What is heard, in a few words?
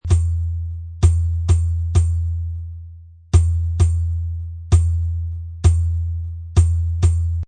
Loop Low Hand Drum Beat 130, Hand Drum Loop At 130Bpm.; Percussion Loops; Low Hand Drum Beat2 Loop 130Bpm